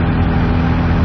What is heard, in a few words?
V8 Idle; 暴力赛车